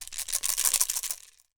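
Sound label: COW RATTLE, PERC; AKAI S6000 CD-ROM - WorldSounds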